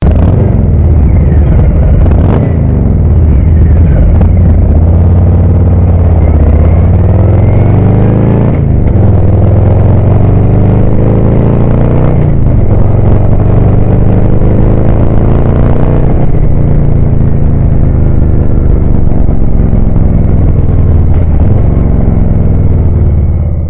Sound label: 2018 Softail Mufflers; ponteira neighbor hater; cromada; softail 2018 a 2023; cobra usa